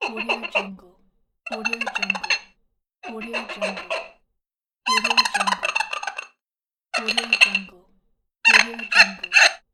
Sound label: squeaking dishes; fx-library